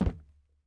plastic land

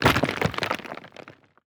masonry break

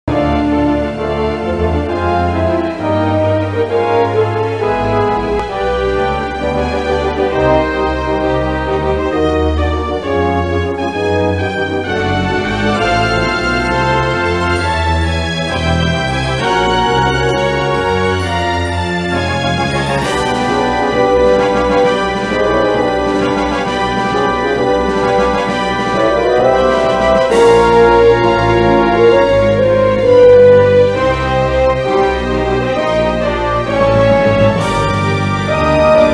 Combat Music; wing commander iv the price of freedom ms dos ps1 windows gamerip 1996

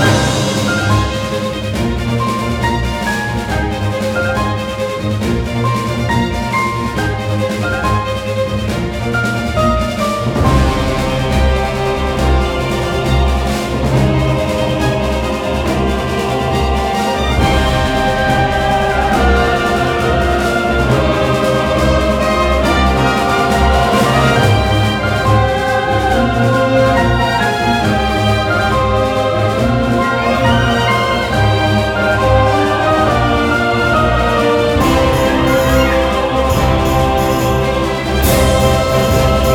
Normal Battle Loop

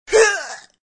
Dies; Sonic.EXE; Tails Nightmare 3 demo

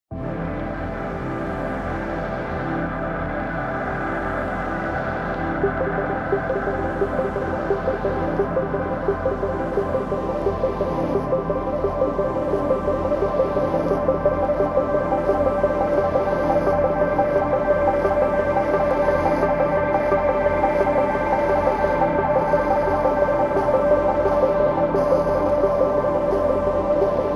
Music Elevator; Deadcore

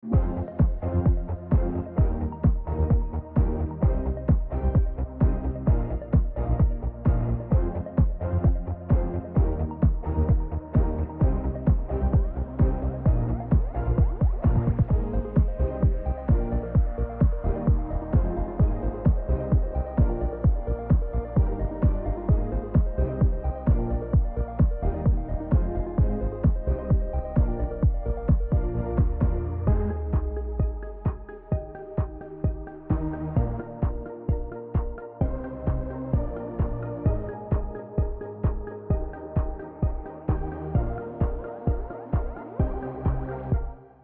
music formal low; Fall; Equestria Girls

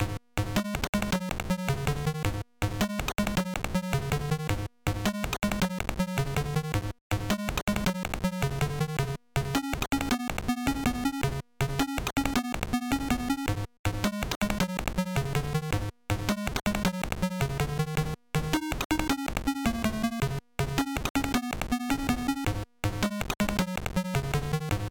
Slots; lynx casino

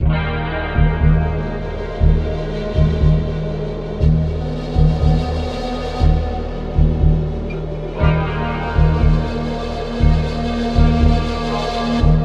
Town Music; Hero Siege; Android

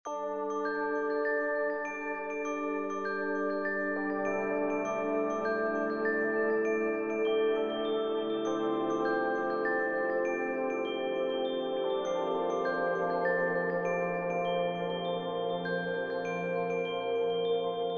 Campfire; delver

